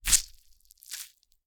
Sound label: gore - crunchy flesh impact and blood squirt - hard; Timothy McHugh; Gorification [HD]